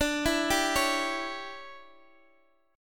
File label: D Major 7th Suspended 2nd Suspended 4th, Guitar DADGAD